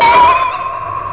ping big; EFFECTS

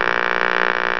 static

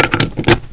PLUGIN, MACHINES

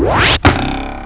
ARROW, WEAPONS

